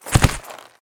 Raptor Mount Attack Underlay

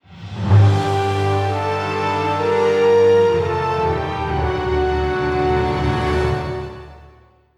stinger

